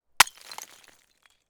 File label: ice hit ground; nature